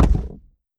reel Bounce; netent

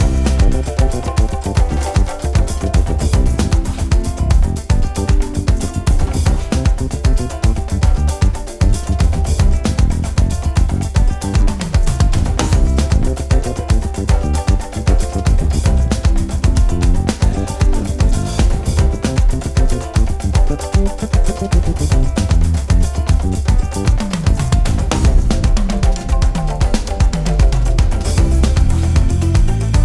music free spin

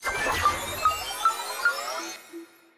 ladder Counter; netent

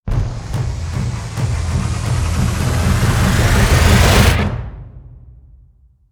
near win; netent

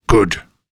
map more search; netent

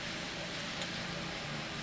reel Spin; netent

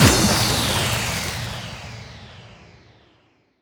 Wild Water Big Win Start

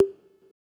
bop; netent

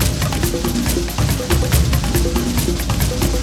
respin loop; netent

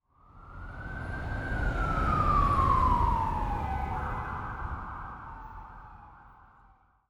amb pool; netent

